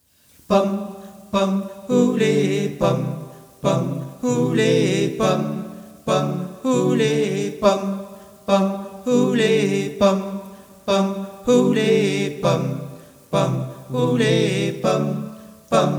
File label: Shosholoza voices; Des work-songs au blues